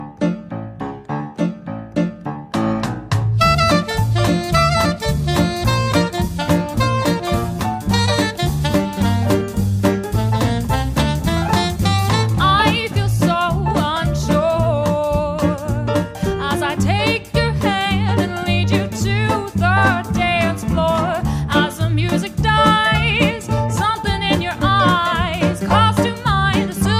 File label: Pop